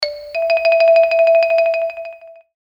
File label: chinese xylophon